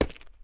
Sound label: walk, footsteps hard surface; elite footsteps